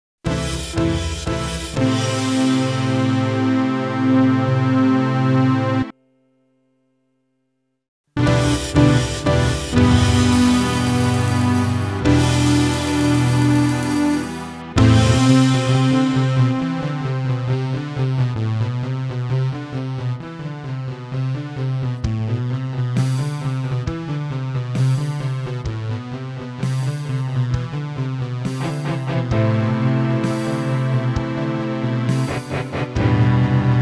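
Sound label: with Drums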